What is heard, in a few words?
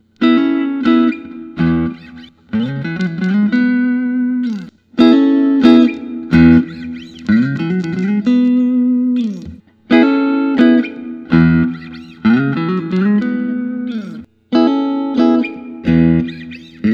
lentz traditional s 2tsb, guitar